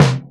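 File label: snare, Boss DR-202; Drum Machines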